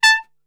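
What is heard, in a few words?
A 3 piccolo trumpet short, brass; Best Service; Brass Super Section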